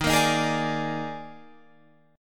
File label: Eb Major 7th Suspended 4th Eb, Guitar, Drop D